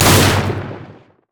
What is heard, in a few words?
reckless swing; olaf